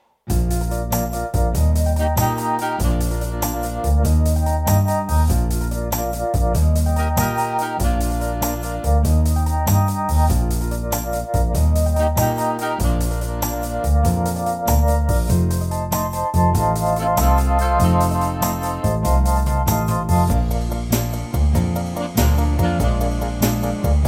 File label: 12-8 Pop